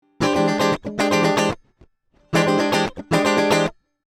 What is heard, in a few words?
Git Funk High Impedance; Cloud CL-Zi